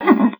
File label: FRET, FINGER MUTE; Xsample VOL-1